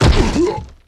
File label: Football Hit Tackle